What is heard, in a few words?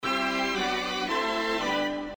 Strings